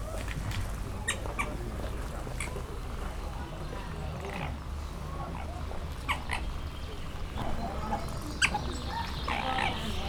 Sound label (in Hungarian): hangossá válik, prérikutya; pecsi zoo 2017